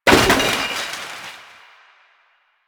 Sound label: Garbage Bag Destroy; Warframe; Ambience; Nineteen Ninety Nine